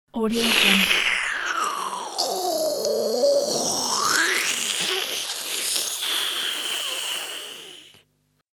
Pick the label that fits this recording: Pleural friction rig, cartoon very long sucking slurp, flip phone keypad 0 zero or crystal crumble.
cartoon very long sucking slurp